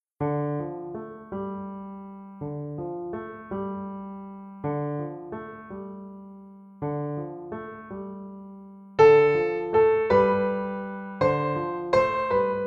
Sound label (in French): Piano seul